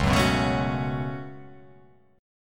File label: C sharp 7th Suspended 4th Sharp 5th C sharp